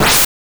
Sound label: Screen cancel; itgmania